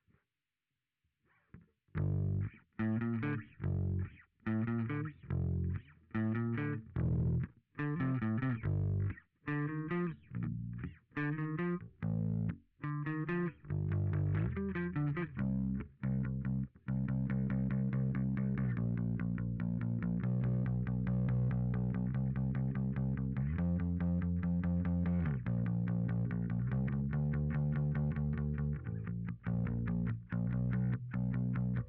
Bass Sansamp

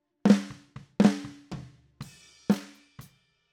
SNR TOP

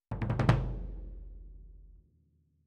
Cassa Front

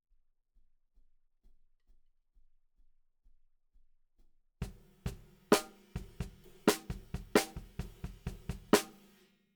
Snare Bot

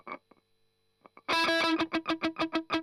Strum Octav

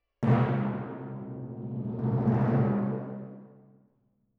Timp

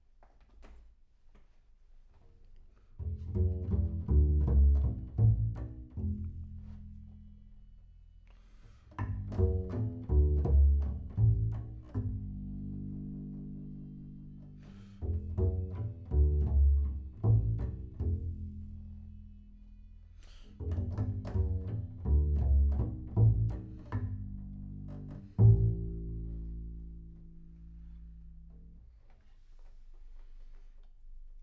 Bass Room

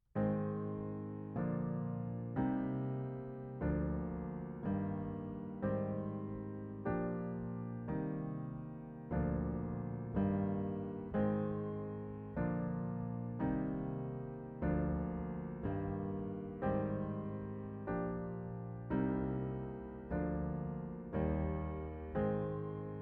Piano Mono

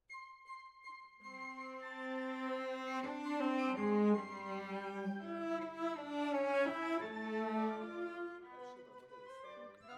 Cellos

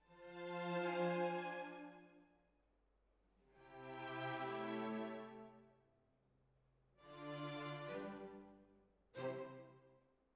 Hall, Jazz for piano

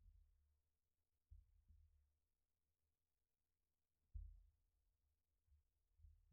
Sub

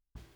SNR Bot

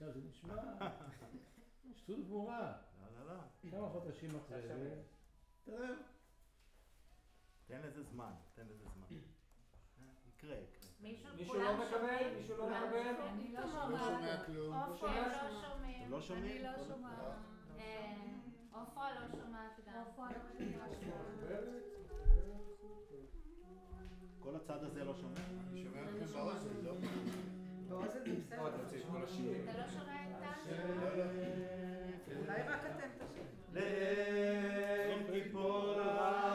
Room, Choir

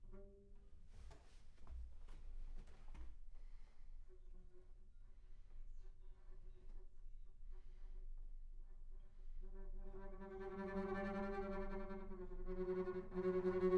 Bass Room

